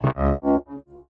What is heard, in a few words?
Trombone; viewfinder; Special-Photo Impossible-Triangle